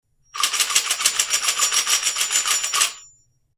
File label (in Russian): Kopilka